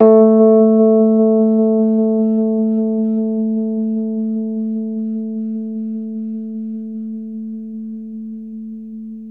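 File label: Rhodes, A2, right; 3D Sound; 3D Keys VOL-1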